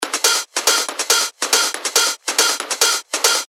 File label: 140 BPM, techno loops; Alakazam; Revealed Techno Top Loops Vol. 9